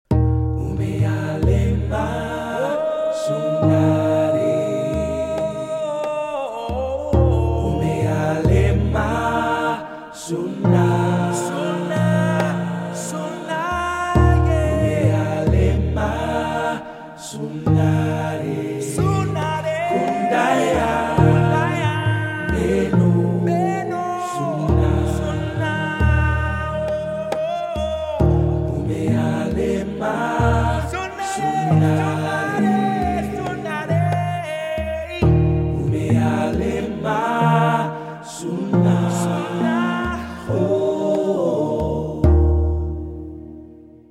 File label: Vocal; World Vocal; filmmusic